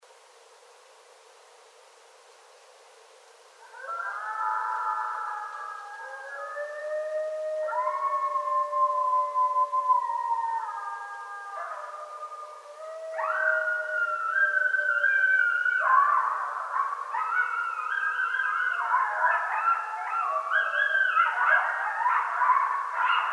Coyotes; Coyotes (Canis latrans)

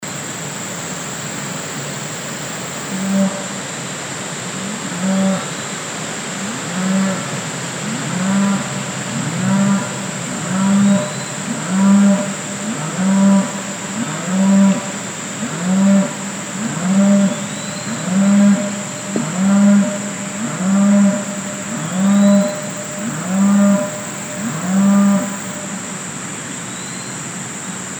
Cattle

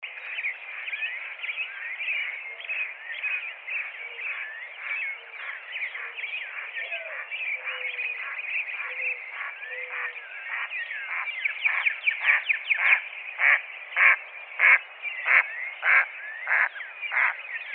Mallard

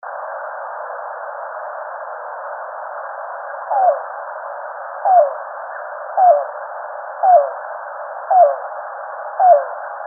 Yellow-billed Cuckoo

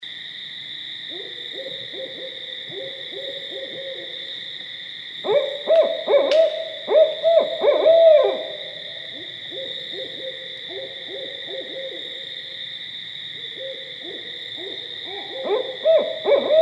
Barred Owl

